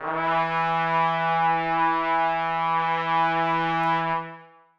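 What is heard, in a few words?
trumpets sustain e3; Sonatina Symphonic Orchestra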